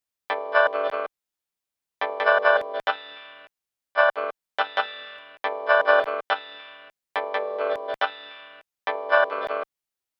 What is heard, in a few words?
Ordinary Groove